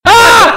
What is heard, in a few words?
wildcat pain